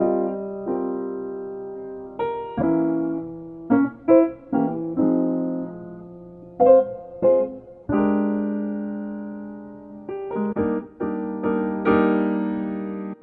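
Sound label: Fortepiannaya Muzyka vibrofon, piano music vibraphone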